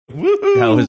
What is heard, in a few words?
woohoo